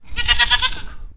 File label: goat; ANIMALS